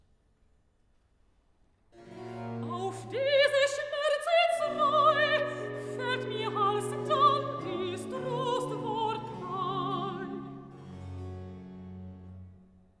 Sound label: Recitative (soprano); Johann Sebastian Bach; Cantata BWV 199; Auf diese Schmerzensreu; Gardiner